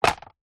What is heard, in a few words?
sport flipper fin drop light on ground